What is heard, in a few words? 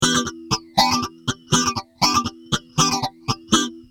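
rhythm guitar, phaser; systech phaser2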